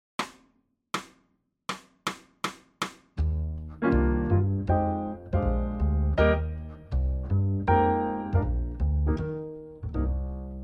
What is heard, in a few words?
Jazz FastSwing DRUMS play along; Trade4s; All-State Jazz